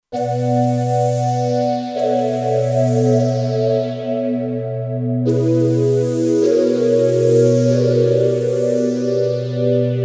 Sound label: Fuzz Lite, Kurzweil K2500X; Factory Programs